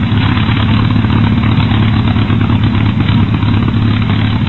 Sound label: p51d rpm